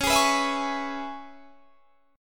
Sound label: C sharp Minor 7th C sharp, chord; C#m7 strummed